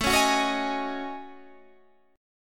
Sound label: F sharp Minor 6th Add 9th, Guitar